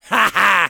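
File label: laugh short, French; Rire court 2; Medic; Commandes vocales du Medic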